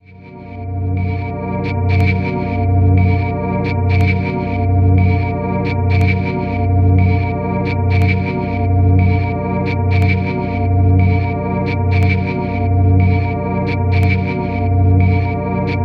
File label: Noise